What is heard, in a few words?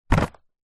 bottle wine in paper gift bag pick up; foley